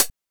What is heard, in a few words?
Closed hat, techno drum